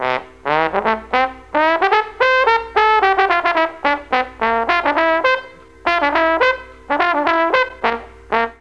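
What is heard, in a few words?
D#3 dixie trombone, Mellotron; Mike Pinder - Mellotron Archive CD; DIXIE+TRMBN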